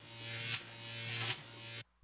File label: Elect Arc Soft, arcs & sparks